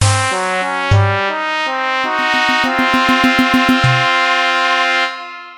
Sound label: Evil Accent; Chipmonk; macOS; PS4; Windows; Xbox One; Xbox Series X/S